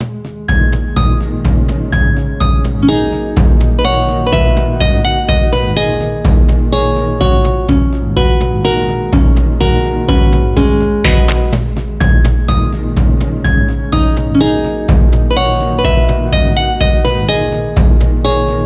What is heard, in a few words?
sad village with claps at the end